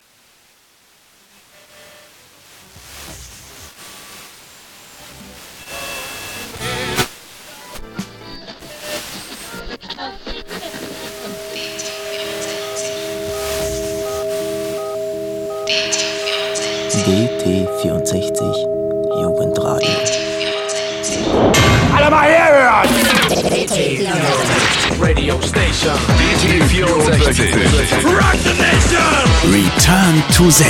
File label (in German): Opening Jingle; DT64; jugendwelle dt64